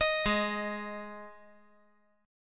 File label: G sharp 5th G sharp; arpeggiated; JGuitar